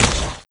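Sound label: maggot footsteps; doom3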